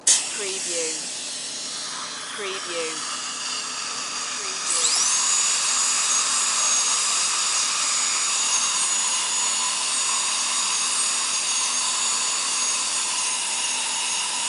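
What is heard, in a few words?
Dentist Drill Cavity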